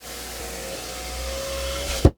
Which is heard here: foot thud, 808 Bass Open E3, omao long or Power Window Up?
Power Window Up